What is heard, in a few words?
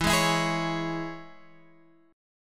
E 6th, down, Guitar, Half Step Down